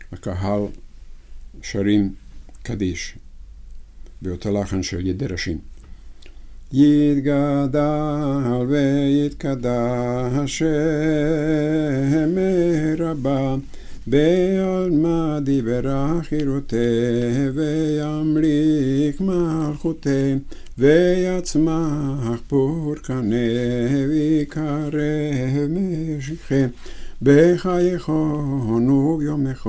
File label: Kaddish yehe shelama kahal